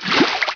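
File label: water out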